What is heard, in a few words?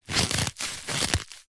plastic cellophane thin wet squeeze squeak creak; foley